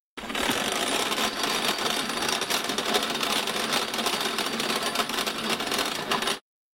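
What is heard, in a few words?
metal cable sounds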